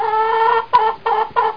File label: chicken; Wired for Sound Pro CD; Aristosoft, Inc.; funnysnd